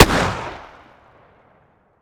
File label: shotgun sup fire atmo ext; gmod; viper